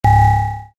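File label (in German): gerät misc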